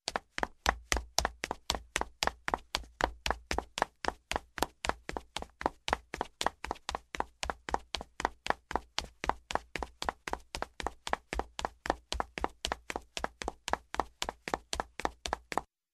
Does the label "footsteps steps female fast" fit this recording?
Yes